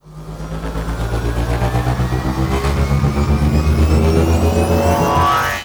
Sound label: Ship Hyper Speed Charge Up